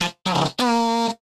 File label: Fanfare; johnny upgrade